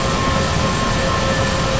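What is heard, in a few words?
pipe ambience loop; Tony Hawk's Project 8; XBOX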